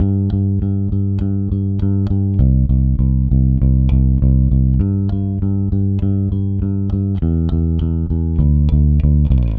Rock, Bass; Bass X

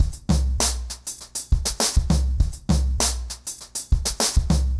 Remixed Drums 100BPM; The Roots Drumloops

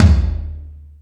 Toms 1, drum machine

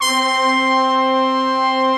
BIG BRASS; OMI - Universe of Sounds

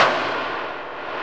HAMMR HIT, METAL & WOOD; Roland - LA Composer vol. 1

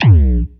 Arp Bass A#, One Shot Bass

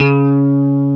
GUI STRATO, E-Guitar; E-GUITARS

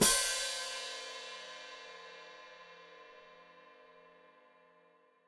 Hat Closed Open, Hi-Hat

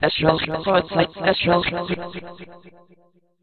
Mouth Music, Vocal; Sonic Foundry (Sony Creative Software); Myths of Technology

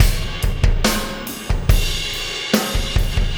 Impact Crater Beat, Processed Drumkits

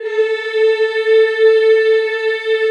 CHO A3, Eeh; Eeh + Ehh

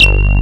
WIDGET BASS; Pro-Rec - Synthsonic; BASSES

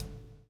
PULL, Backbeat; Spectrasonics - Backbeat

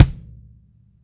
BD, Bassdrums, Roland TD-20

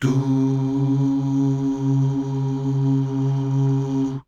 DUUH

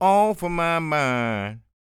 SING, Vocal Planet Blues & Soul

